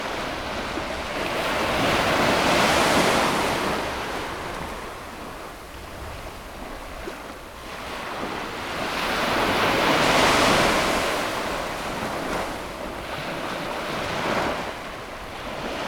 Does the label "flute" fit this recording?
No